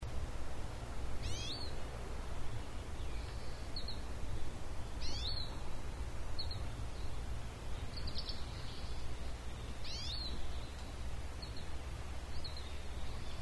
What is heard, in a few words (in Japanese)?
mahiwa guzeri